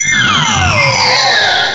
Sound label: cry; source of the sovereign